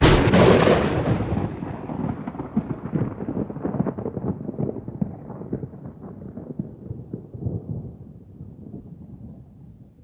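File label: Thunder Huge Clap Low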